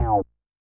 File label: DEEP DIVE; Yamaha DTXpress-II